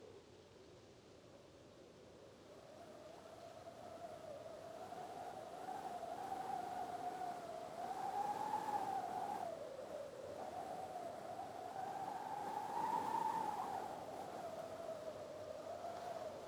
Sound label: ambience arctic beach windy; Rust